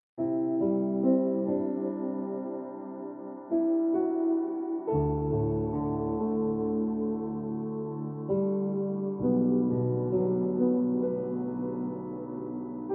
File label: Piano Music